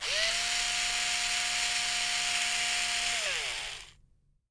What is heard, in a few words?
ELECTRIC DRIVER; 1000fx